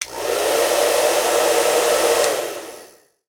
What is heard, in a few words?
Blow Dryer, Bathroom; 1001 Sound Effects